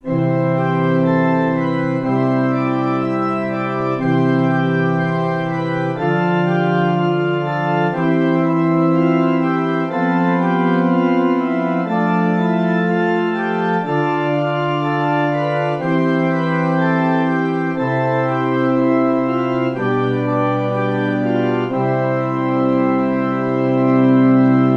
Stimmiger Contrapunkt; Ronge

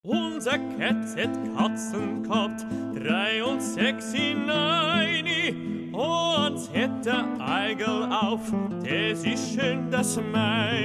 österreichische Lied fur singstimme; Hess 133 Das Liebe Katzchen

